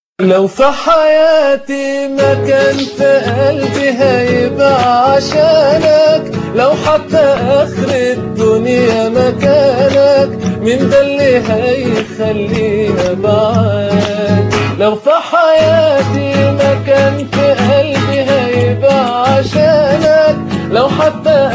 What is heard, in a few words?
Guitar; egypt